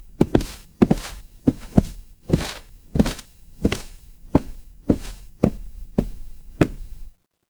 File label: footsteps wood